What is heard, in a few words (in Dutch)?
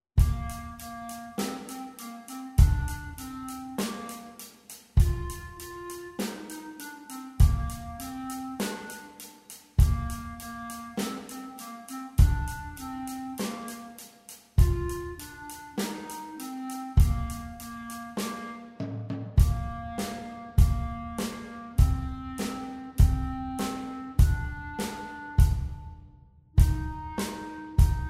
Rock Klarinet